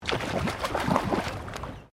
Bird Dusky Moorhen Fishing, Australian Birds; Birds (Sound Effects:Animals)

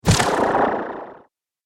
Wizard Web Of Power, magic sound effects

